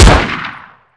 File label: pistol fire; weapons; pistol zm